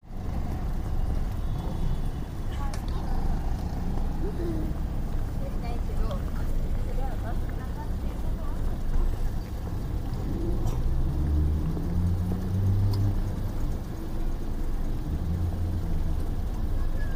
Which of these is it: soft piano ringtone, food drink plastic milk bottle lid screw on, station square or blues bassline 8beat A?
station square